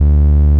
Inharmonicity D2

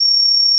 PeakSlope C#1

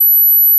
Gauss Filt B7

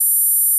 Gauss Filt F#6

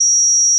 PeakSlope C4

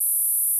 Gauss Filt C4

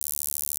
Gauss Filt D2

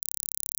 Gauss Filt C#1

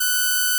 Inharmonicity F#6

